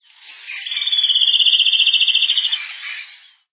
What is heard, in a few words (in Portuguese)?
caneleiro-cinzento